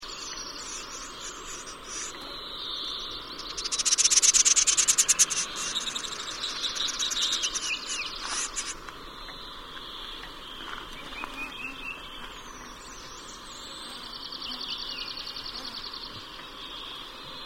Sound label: Galste, Linnut Kahlaajat kurpat; Koshim